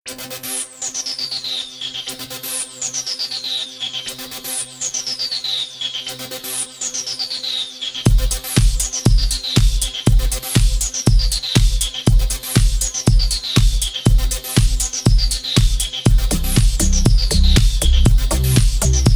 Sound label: techno trip energetic 432 hz